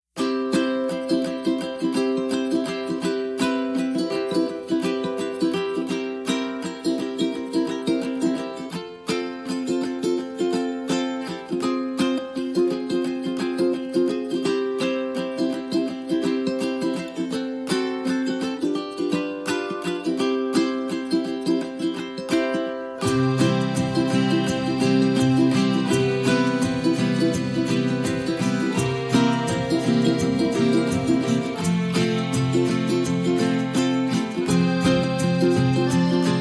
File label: No Jingle Bells No Glock